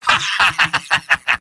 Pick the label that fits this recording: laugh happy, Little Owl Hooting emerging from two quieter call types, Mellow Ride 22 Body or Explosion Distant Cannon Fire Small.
laugh happy